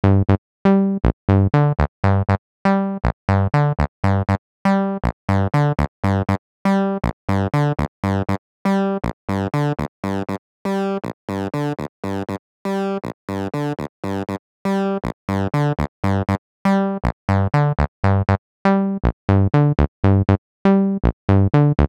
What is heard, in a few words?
Roland TB-03 Distortion